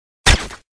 glock silencer sound effects

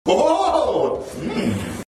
mmmmmm

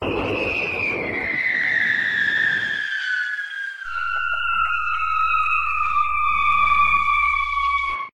falling sound

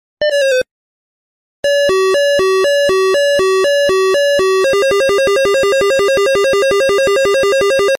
F16 locked

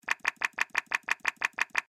macbook increasing

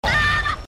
yelp

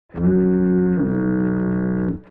fog horn awooga

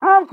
cheers; pyro

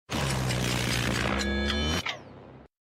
time stop sound effect; dio; za warudo

